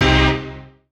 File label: chords